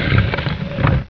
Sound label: wood; chasm